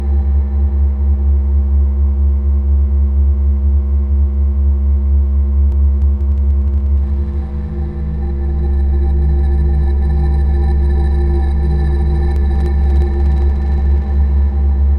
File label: Ambient; Lian Lian Kan